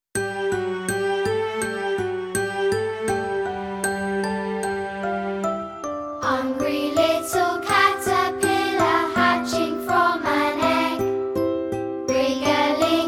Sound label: Vocal